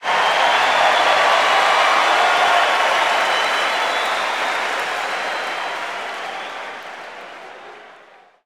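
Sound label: Basketball Crowd Cheer; Crowds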